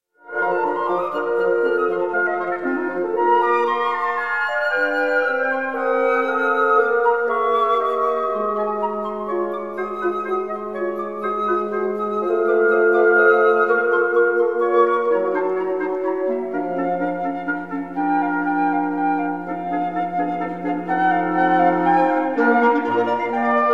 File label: wind quintet; berlin philharmonic wind quintet; franz danzi; quintet in g minor op 56 2; 1st movement